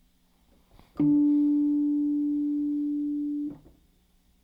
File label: rhodes note